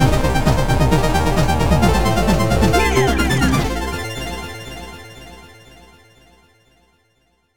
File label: Win Jingle; Iron Crypticle; PS4